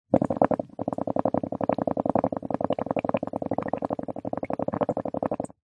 nature water blowing air bubbles in bowl of water foam